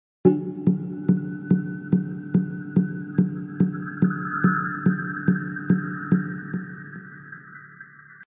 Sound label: musical ethnic dembie drum hits atmospheric 120 bpm; audio mangler